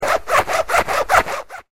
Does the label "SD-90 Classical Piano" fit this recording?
No